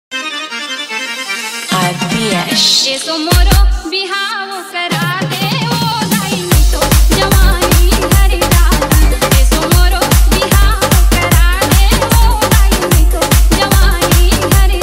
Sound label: OLD CG HIT SONG UT DANCE REMIX